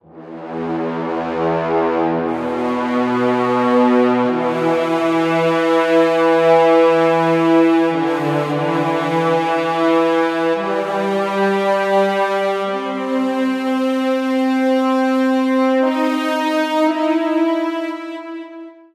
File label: Cave Mid Hi Cor